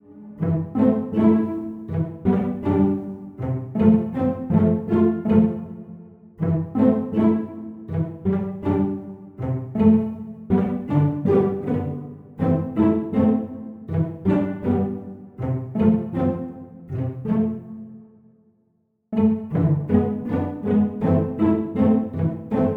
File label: cello pizz; пиццикато